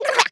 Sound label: Otter hit